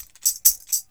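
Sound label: TAMB, tambourine; Drumdrops In Dub VOL-1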